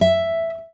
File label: Classic Guitar; Realme 8